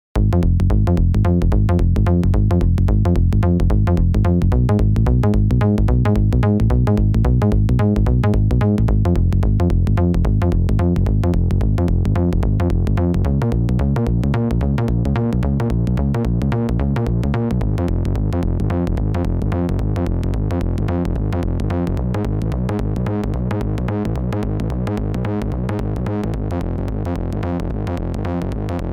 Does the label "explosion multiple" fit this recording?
No